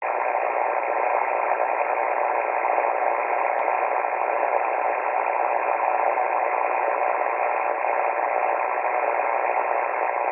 Powerline RFI

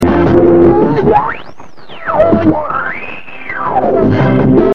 Swoosh; VFD-Regen; With the regen advanced to a point just below oscillation the B.W. is very narrow making the tuning very sharp.

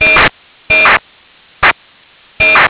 acars

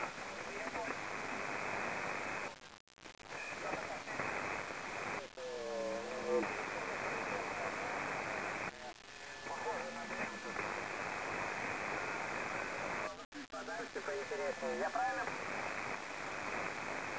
40meter noise SSB

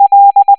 l tone

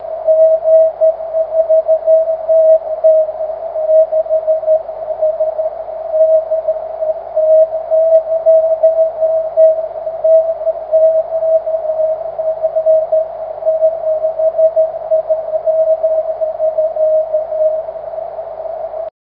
cw

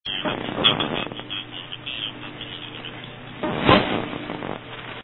nrd545 burps

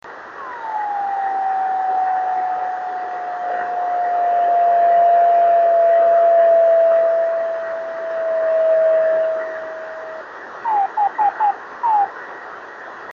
28146KHz

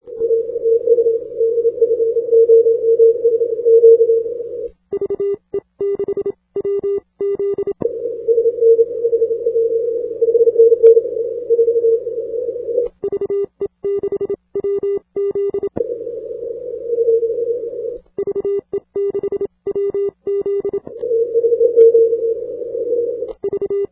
80m CW

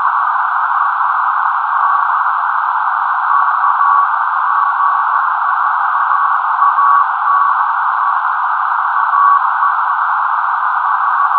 EME CW, satelite

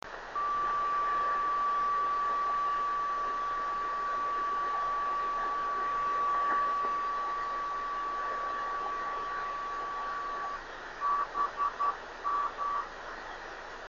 28330KHz

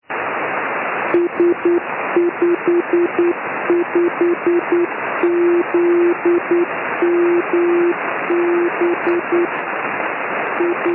beacon; s55zmb

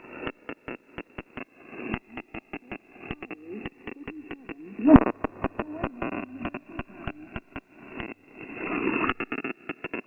wwv nb off; icom ic7600

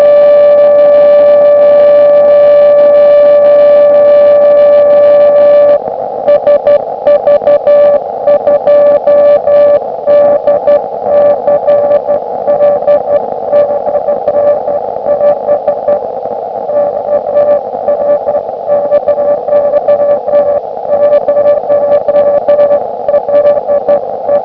beacon; sv2dcd

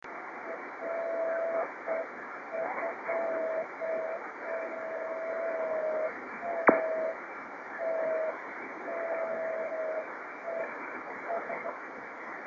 dying Driftnet 26610 KHz; unreadable

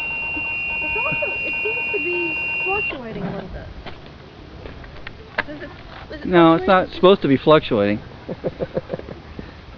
Fluctuating

